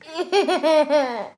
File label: baby laugh 18 months